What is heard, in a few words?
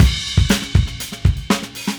Funky Drums Crash; Sonic Foundry (Sony Creative Software); What it Is! 70s Analog Funk; The Funky Mama